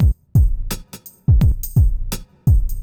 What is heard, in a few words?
lazy days 85